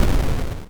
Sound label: Panel Weapon Hit; Among Us